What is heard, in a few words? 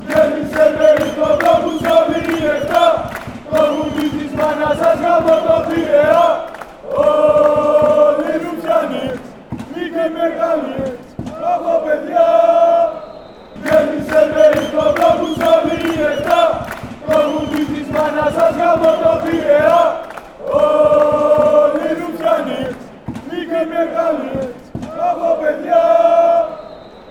aek athens chant